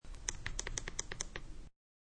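Electric Button Push, Pushing quickly up and down on an flashlight button; Part of Flashlights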